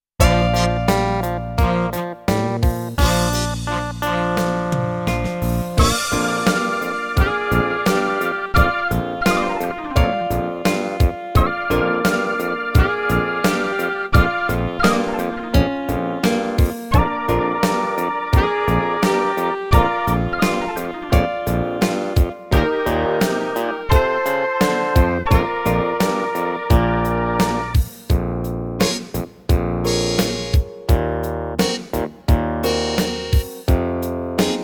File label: Blues Bb Backing